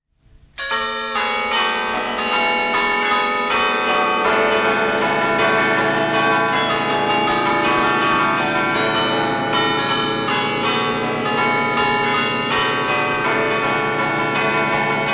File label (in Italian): campane; suonata "doppia" per dieci campane; Zogno, 9 - 8 - 1985. Registrazione di Valter Biella